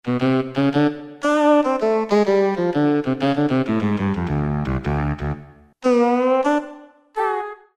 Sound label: Tenor Saxophone; Roland PN-D50-01